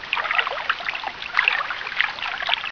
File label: trickle